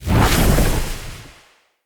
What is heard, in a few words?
Lightning Slam Charge; Characters; Enemy; Abilities; Sinecure; Banneret